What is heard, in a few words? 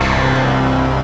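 Rave Synth, Dance Mega Jungle Rave; Best Service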